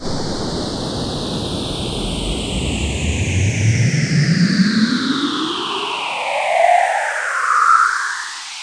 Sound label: NOISE; ensoniq